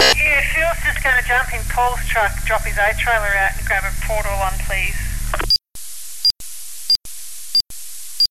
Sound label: EDACS analog FM